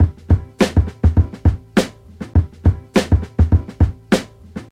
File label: 102 bpm drum loop sample e key, kick tuned to the E note. Loudest frequency: 522Hz